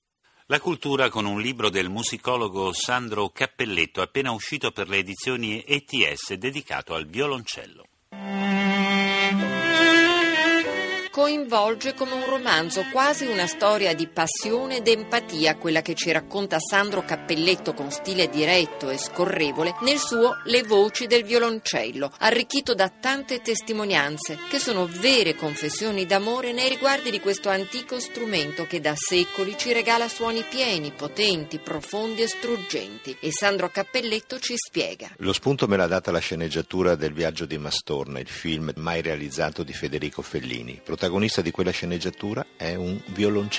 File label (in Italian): violoncello radio; rai